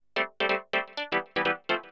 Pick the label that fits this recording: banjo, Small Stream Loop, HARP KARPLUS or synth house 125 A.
synth house 125 A